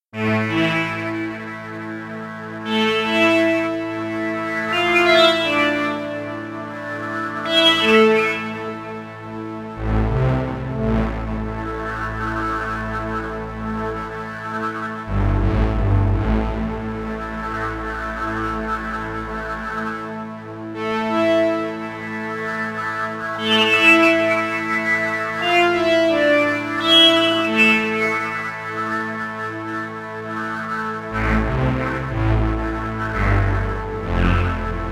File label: Oberheim Sem V; arturia